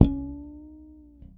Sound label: Harmonic Bend G, Bass; Construction Kit; One Shots; Bass X